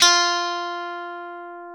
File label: funk F3, strat; InVision Interactive; Lightware VOL-1; Instruments & Percussions